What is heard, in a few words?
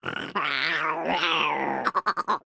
crab Return; reef raider